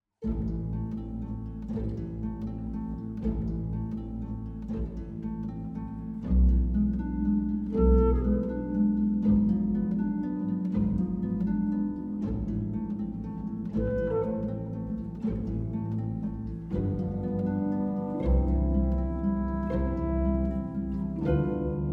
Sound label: piano